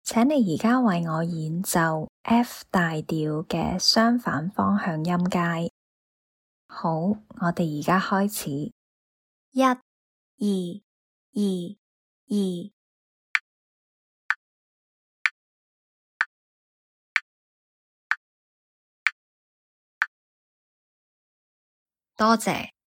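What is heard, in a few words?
F major contmotionscale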